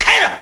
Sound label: Japanese Shout; Mars Sortie